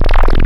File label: Squelchy; Synthetic's Serum Collection